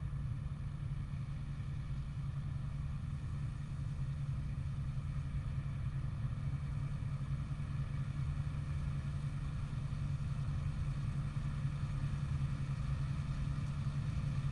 harvester claas lexion 530 bypass; fvtt sonniss gdc audio

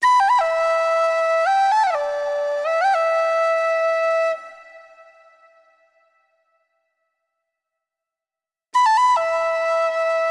musical element flute melodies; sonniss gdc

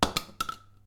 shotgun shell land lino; sonniss gdc

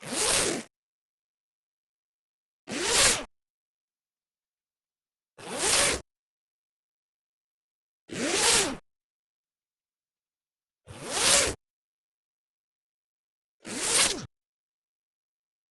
wool coat metal zipper fast zips; Sonniss GDC

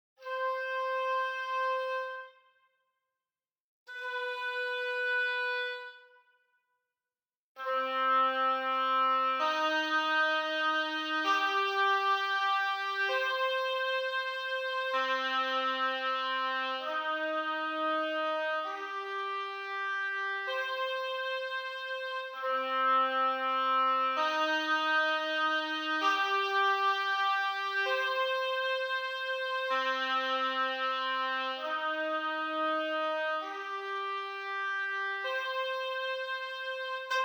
Orchestra Oboe; McRoMusic; Summoning Circle

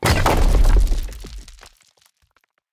landing impact small, creature movement flight

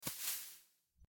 footsteps grass; weather and nature

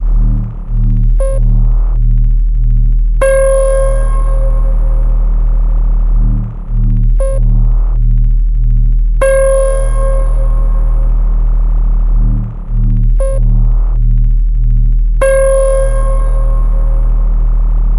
ambience synth tonal; bluezone; sonniss gdc

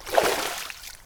water swim; baileywiki maps premium towns

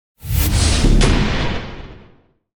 magic missile, spells; 1st level spells

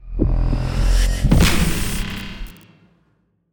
eldritch blast, cantrips

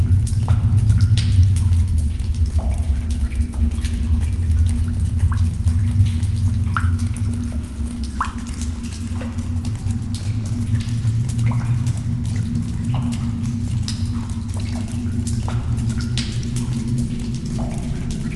cavern sound effect short loop; sonniss gdc

